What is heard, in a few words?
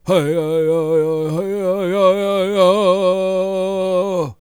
World Voices, CHOCTAW